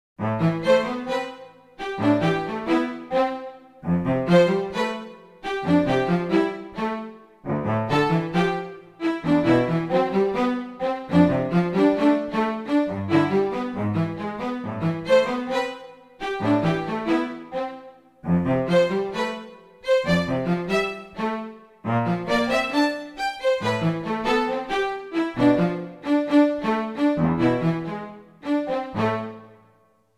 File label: string